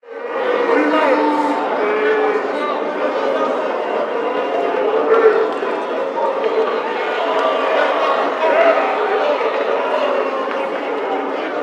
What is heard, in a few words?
Crowd Heckle Lrg Room; Prison Architect